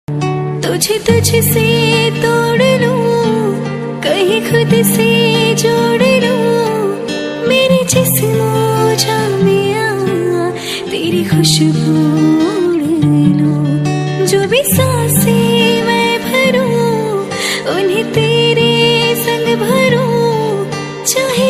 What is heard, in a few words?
Romantic Hindi Female Song Ringtone